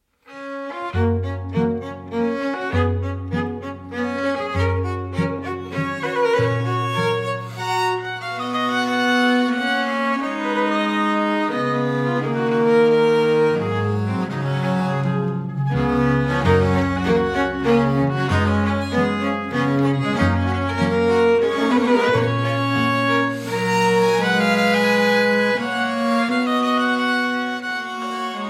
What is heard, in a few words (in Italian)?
quartetto in re maggiore minuetto